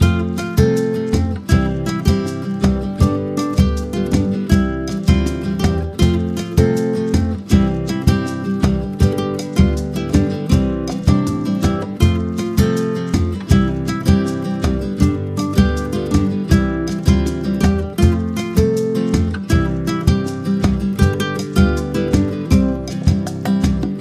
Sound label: Calypso Dance - Loop